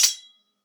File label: sword parry ring